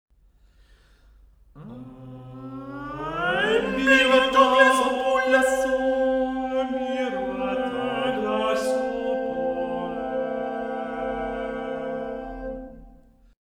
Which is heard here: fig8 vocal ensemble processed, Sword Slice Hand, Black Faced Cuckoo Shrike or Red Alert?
fig8 vocal ensemble processed